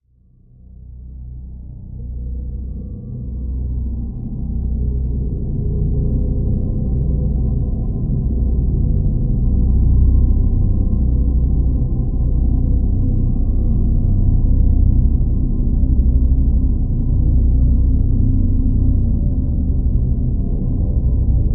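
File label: Drone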